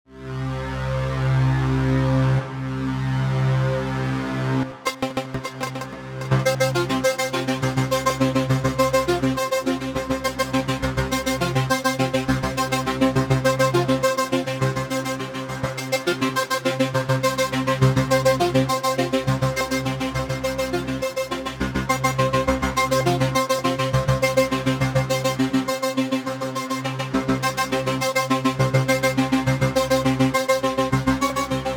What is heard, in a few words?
arturia efx fragments